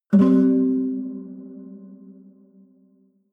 low string buzz dissonant double

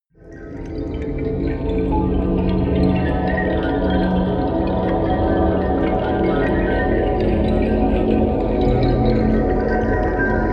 wicked wind chimes

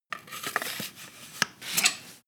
sword scabbard handle pull soft

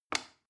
plastic button small release regular

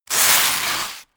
sandpaper grind

